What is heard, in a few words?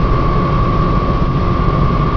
fan loop